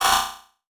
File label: Click Mechanical; Multimedia Internet CD-Rom Flash